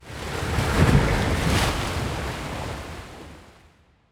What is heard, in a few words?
ocean planet; ambient; Stellaris